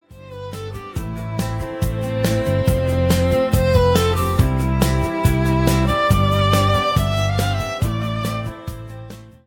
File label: sonic logo warm premium; songprintz